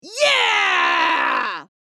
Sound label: Female yeah reaction